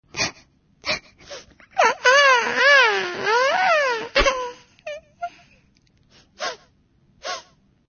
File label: crying; baby